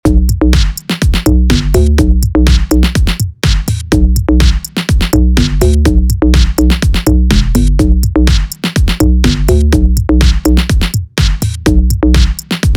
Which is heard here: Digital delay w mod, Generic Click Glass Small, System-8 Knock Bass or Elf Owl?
System-8 Knock Bass